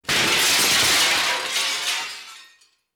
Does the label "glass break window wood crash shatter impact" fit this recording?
Yes